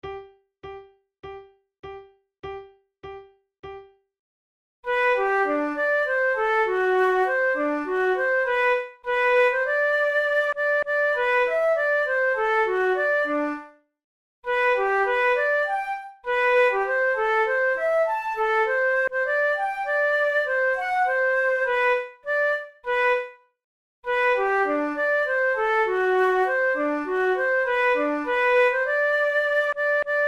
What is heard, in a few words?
duet in g major; kohler op93 no1 duet no2